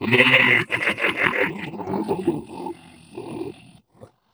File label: ballbot evil laugh; player; Portal 2